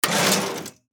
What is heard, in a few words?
Cash register drawer open